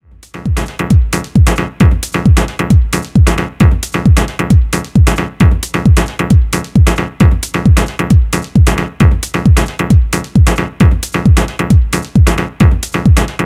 Loop (locked groove)

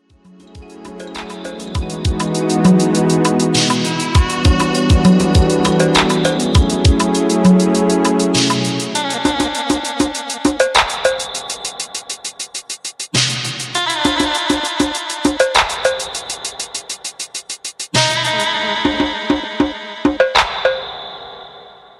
Marimba Theme

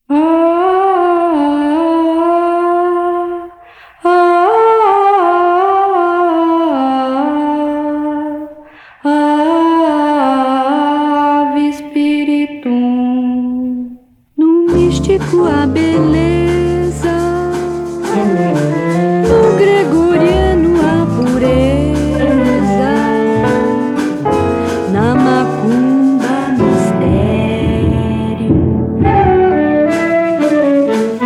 samba gregoriano

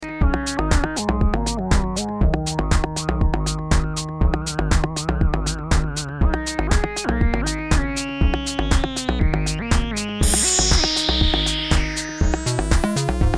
New Drum Kit

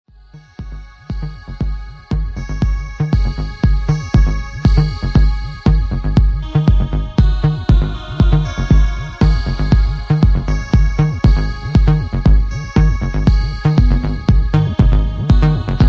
acid cowl

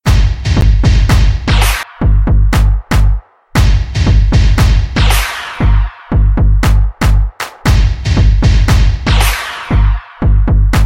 Alesis Drums

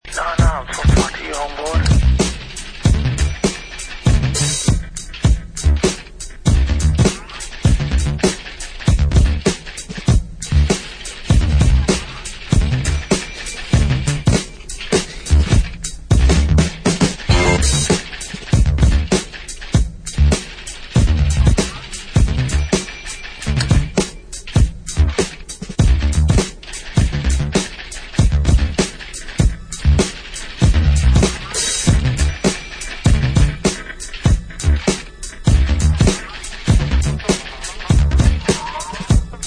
snap n' clap

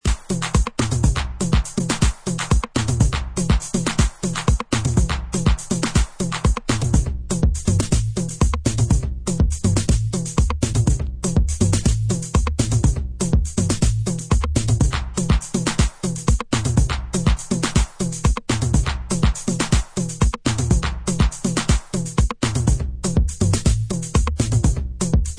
indigenous beat box

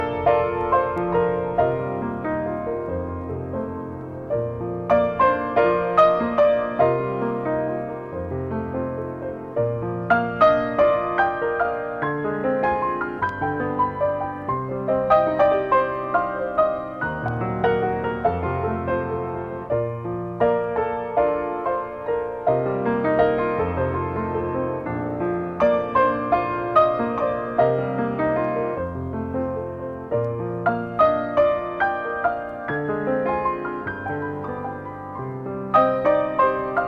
Piano version

